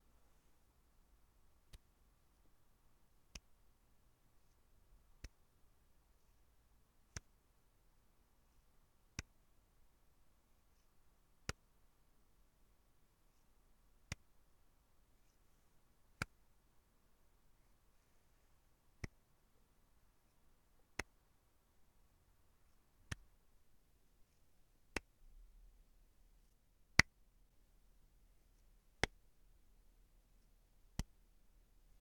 Hand Clasp

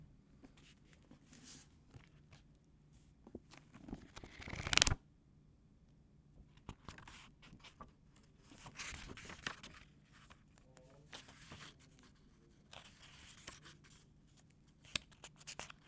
Book

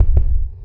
Single Heartbeat Clean; Beatsmith

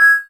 Gen bip

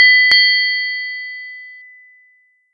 Ping Ping

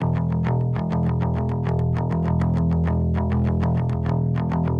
Crunchy Guitar Loops 100 Bpm

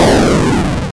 Explosion 8 Bit

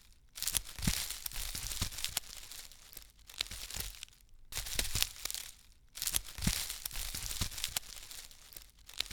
Leaf Rustle

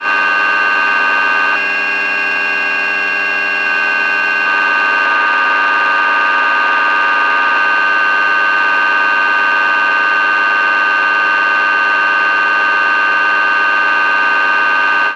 AM 18.245 MHz

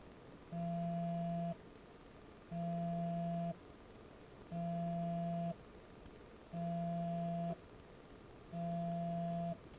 Mobile Phone Vibration

